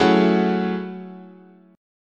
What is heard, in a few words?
F sharp Minor 9th, guitar chord